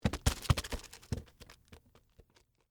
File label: Roll Cabbage Head, Texture, Roll, Cabbage, Head, Vegetable; Food; Household; BlastWave